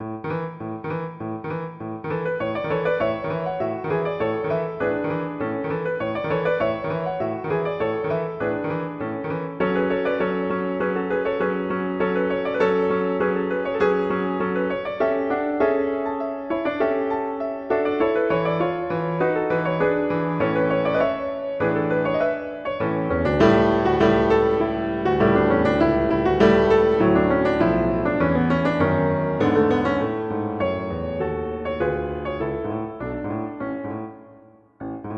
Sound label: Bridal Song; Grieg; Norwegian